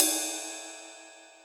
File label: Real Open, Hats